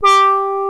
ANALOG COMBO; OMI - Universe of Sounds

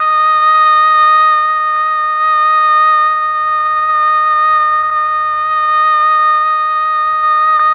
OBOE; ILIO; Propeller Island Legendary M400

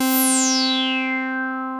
303 FAT, BASSES; Pro-Rec - Synthsonic

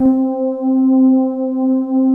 MUTE F PADS; Pro-Rec - Synthsonic

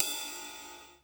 Ride Cymbal, Yamaha EMR-1

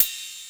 CYMBAL, Hip Hop Misc Perc; E-MU Producer Series Vol. 8 – Platinum Phatt

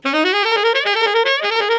CRAZY LOOP, TENOR SAX

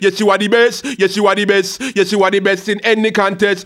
HAL RAGGAM, RAGGA-MUFF A maj

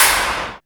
METAL

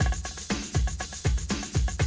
120, Classic Drum Loops

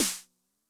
SNARE DRUM Med Tune High Tone, 909; classic machines; 909mod

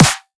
drum snare blaster; Virtual Riot; Drum Hits

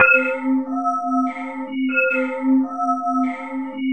INHARMONIC; Zero-G Ambient 1

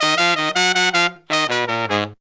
160 bpm, horns; 90 s Sample CDs; Zero-G; Phantom Horns

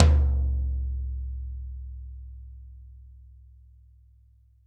Toms; Bob Clearmountain Drums I

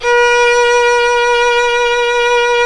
Synclavier Strings; ILIO

